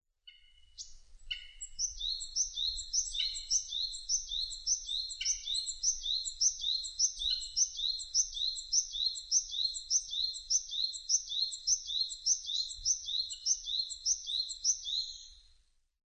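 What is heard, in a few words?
Japanese tit; sijyuukara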